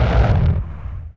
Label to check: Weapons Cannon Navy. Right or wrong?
Right